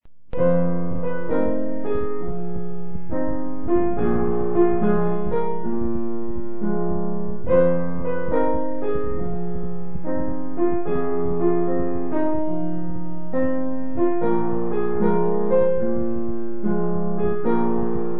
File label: Little Blues Tune